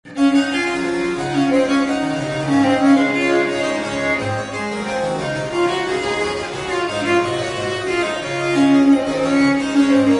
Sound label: allegro; BWV 1028